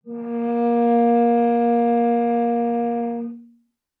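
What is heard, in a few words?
Cello arco fortissimo sul C Bb2; MIS Pitches - 2014; Strings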